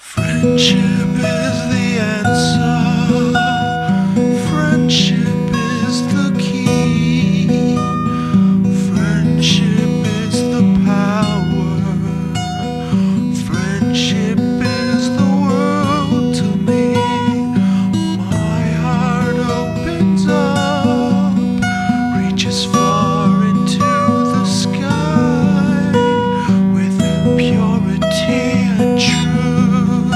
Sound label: bgm friendship